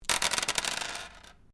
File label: Dice small glass table throw; Variety of dice sounds; Casinos; SoundEffects Foundation2